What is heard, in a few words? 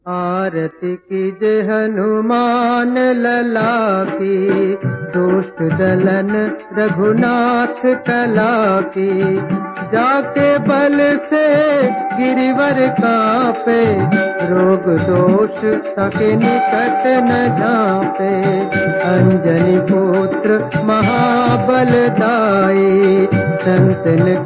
Ringtone Old Voice